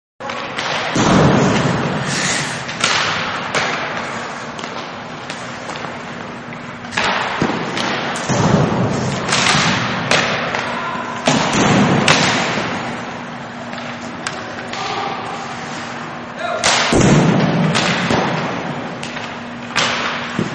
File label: Ice Hockey Game general ambience; Sports; Manhattan Production Music